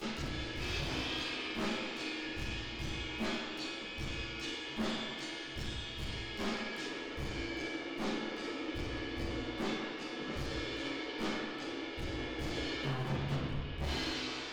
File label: Drums Verb